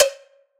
drum perc cowbell; Virtual Riot; Drum Hits

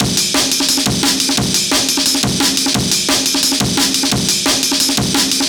Amen EQ 175, classic dnb breaks

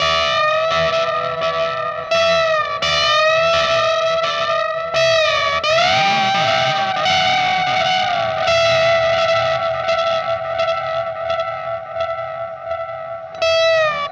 Static FX Guitar; Sonic Foundry (Sony Creative Software); Indie Rock Anthems; Session 13 - Static

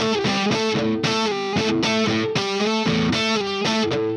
Melody Riff Guitar, Indie Rock; Sonic Foundry (Sony Creative Software); Indie Rock Anthems; Session 06 - Haze

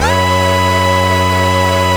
MEDUSA F#3, LEAD SYNTH; Best Service Alpha Dance vol.2

